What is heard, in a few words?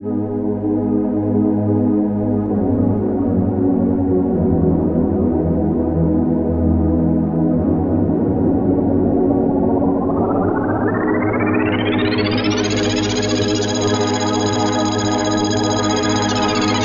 Pad Density Sine pitch mod; alchemy